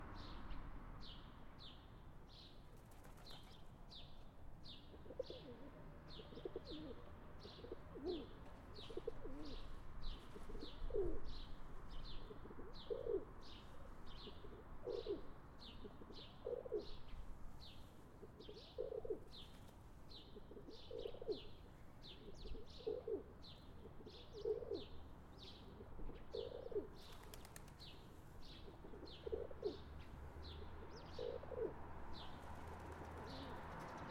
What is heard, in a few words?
Kazan Bird Calls; natural sounds